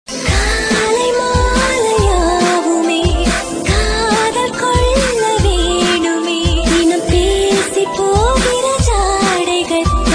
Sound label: nepali ringtone